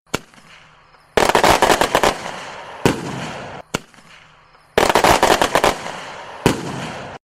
foguete tiros fogos

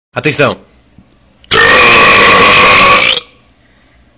melhor arroto

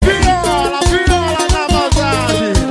viola

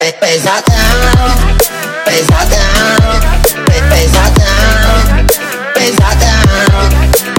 viradrop pesadao remix

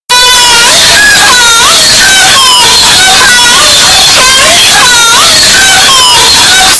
gemidos earrape

